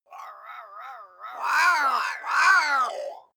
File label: crow single abstract; natur tiere; voegel